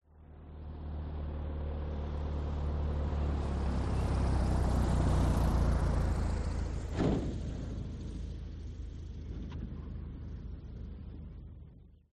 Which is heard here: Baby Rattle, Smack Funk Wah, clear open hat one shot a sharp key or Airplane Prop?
Airplane Prop